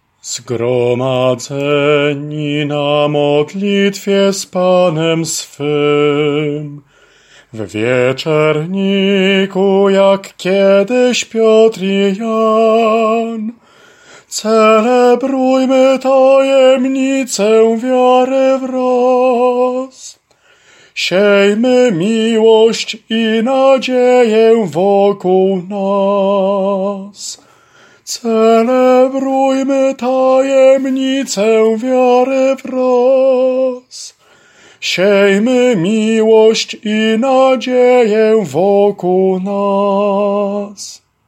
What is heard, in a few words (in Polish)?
SOPRAN